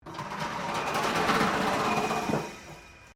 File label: METAL BIN SCRAPE WIRE FENCE; Materials